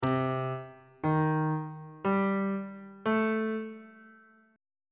d double flat minor 6th chord intervals on bass clef